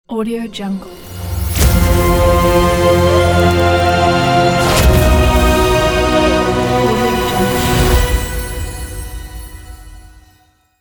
magic logo

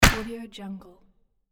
hit wood

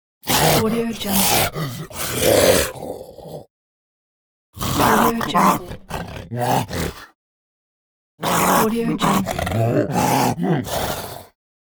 monster attack

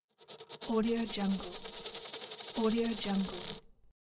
rotating coin on glass surface

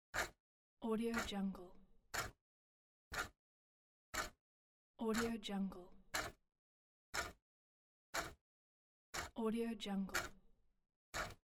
ticking clock

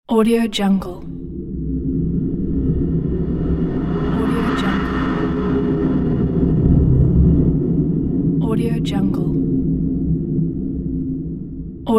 sub swoosh

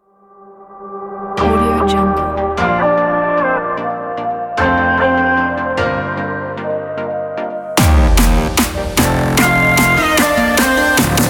energetic future bass

